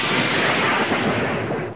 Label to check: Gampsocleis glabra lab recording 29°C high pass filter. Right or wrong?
Wrong